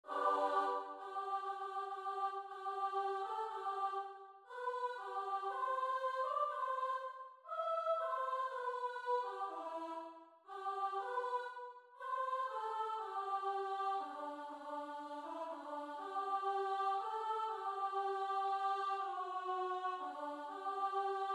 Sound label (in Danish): sopran; Der flyver så mange fugle